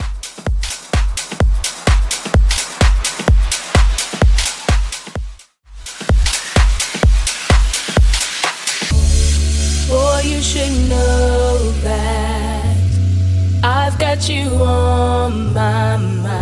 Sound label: Remix 128bpm